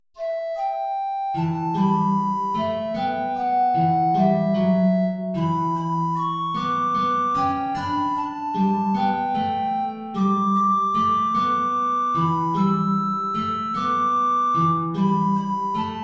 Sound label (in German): Altflöte & Gitarre; O Heiland, reiß die Himmel auf; hohe Notierung